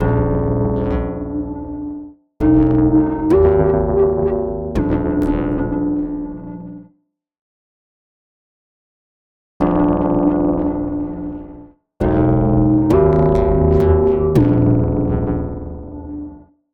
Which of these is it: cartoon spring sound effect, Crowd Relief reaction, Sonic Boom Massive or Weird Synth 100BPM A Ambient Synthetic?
Weird Synth 100BPM A Ambient Synthetic